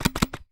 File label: Camera Sequence